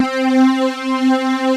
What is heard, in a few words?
SYN STRGS, JV 1080; Pro-Rec - Synthsonic